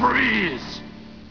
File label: FREEZE